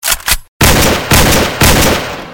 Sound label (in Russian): Tri Vystrela